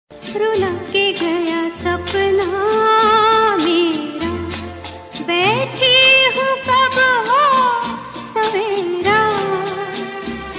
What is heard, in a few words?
Old Hindi